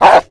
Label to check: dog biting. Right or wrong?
Right